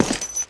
step; HL2 combine

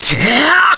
pain; vegeta

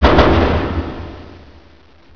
cargo container

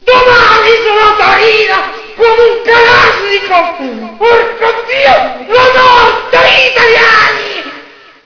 sing, tentacle

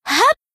jump; hina

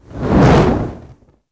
flare movement

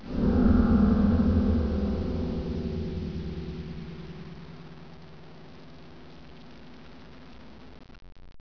Ship death

